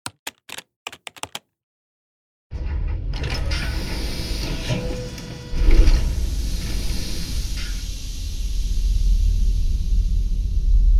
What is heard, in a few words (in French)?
Le Vaisseau ambiance épisode